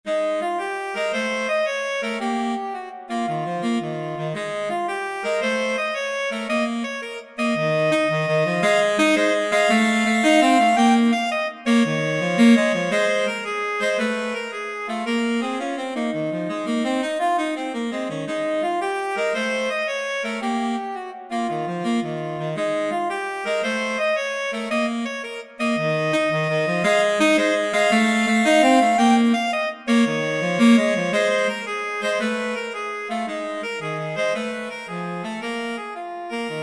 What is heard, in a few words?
duet for saxophones blues